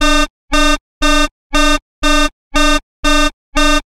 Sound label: Sci-Fi Computer Console Alarm; LoopFollow